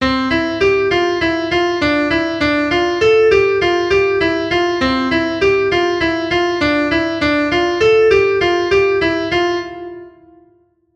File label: Pattern C to Dm